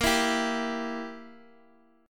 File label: E Diminished Bb